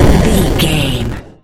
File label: retro explosion deep